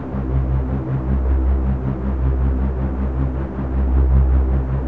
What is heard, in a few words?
fan